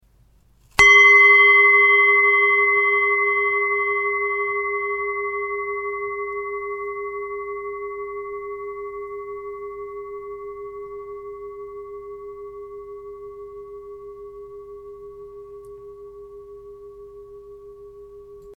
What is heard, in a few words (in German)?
550g, Klangschalen